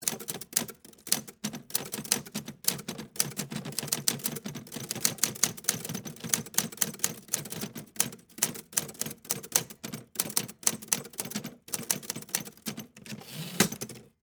Typewriter Type, Technology, Typewriter, Smith, Corona, Type; Typing; BlastWave